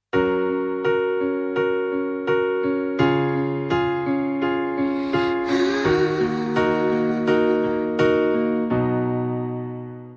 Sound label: Mint piano sms